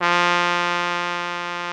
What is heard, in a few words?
trumpet F2; Greytsounds VOL-1